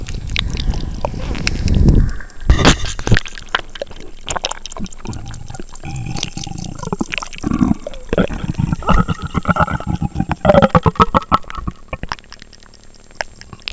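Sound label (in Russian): звук ужасающего чудовища монстр задыхающегося и дышащего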